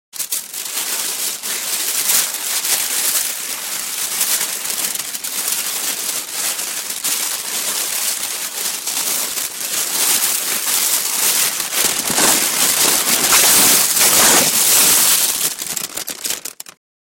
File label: sunchips